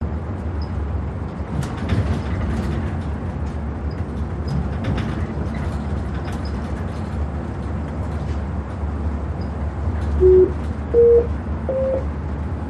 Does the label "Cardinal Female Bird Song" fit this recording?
No